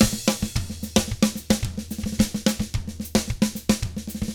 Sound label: SMBA, 110 SAMBA, Drums